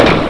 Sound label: Single Gunshot; KGB